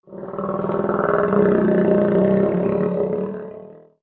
Croc Groan; creatures organic

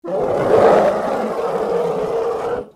Pet Elephant Screams; creatures pets

